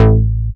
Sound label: Bass Shot A; Mutekki Media; Vengeance Electroshock Vol.2; Bass Shots